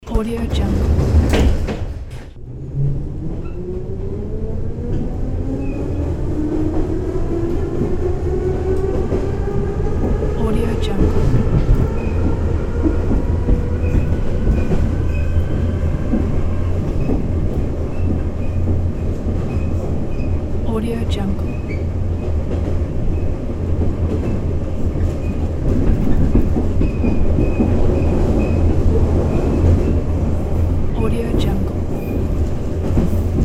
underground train

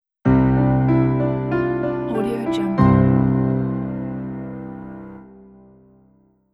piano launch logo; stereogull-productions

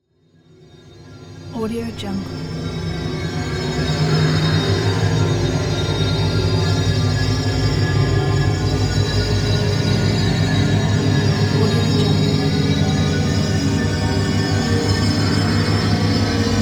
eerie tone

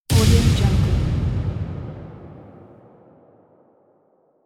metallic gun shot impact

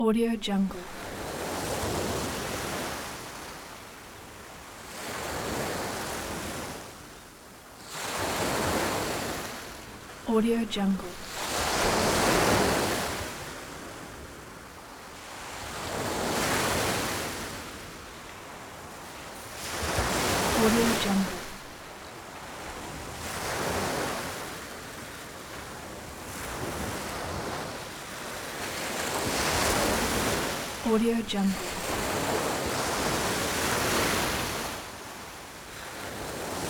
calm sea waves; blacklionproductions

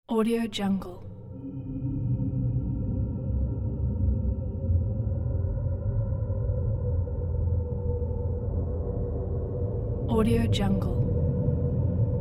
tension horror ambience

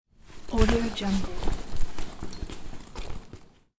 backpack rustle; soundfxpro

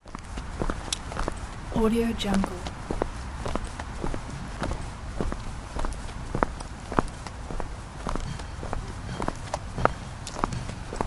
walking on city street

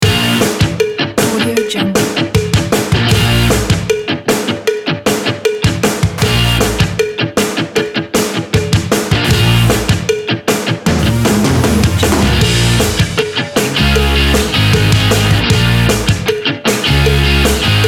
upbeat energy indie rock

